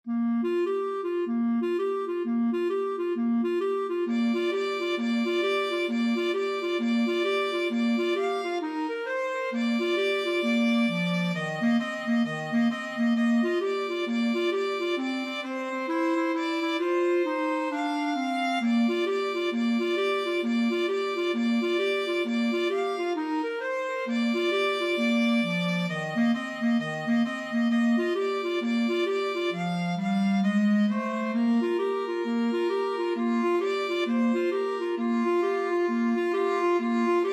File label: boogie, clarinet violin duet